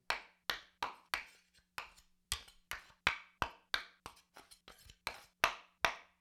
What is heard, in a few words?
Dagger Spear Blade on Haft, Weapon on Weapon; StillNorthMedia